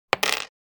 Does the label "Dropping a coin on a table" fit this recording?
Yes